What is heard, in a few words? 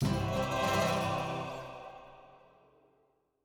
End 108bpm; Music Act Corsica; Asterix & Obelix Slap Them All